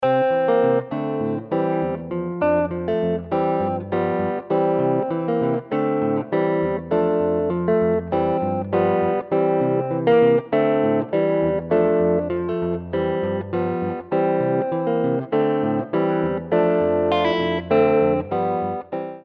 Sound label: amonsah sample, rhythm guitar samples